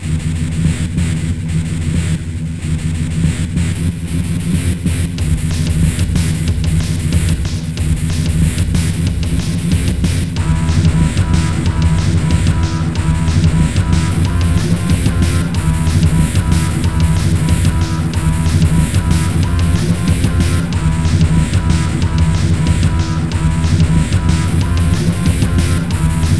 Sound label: it hard rock